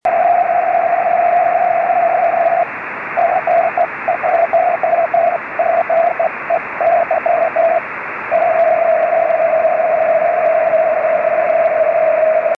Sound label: 3cm CW